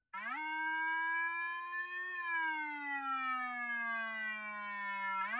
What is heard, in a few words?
Wail (SUBTONE); 911 Signal